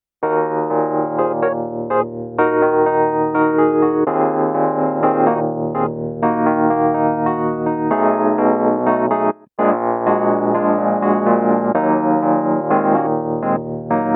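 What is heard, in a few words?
wurlitzer; kurzweil forte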